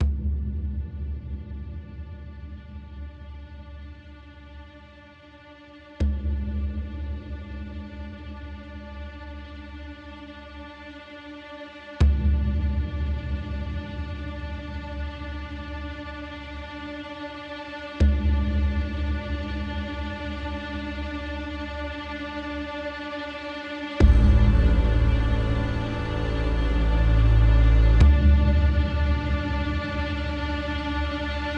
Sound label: drone tremolo strings shorter; NTC - Northumberland Theatre Company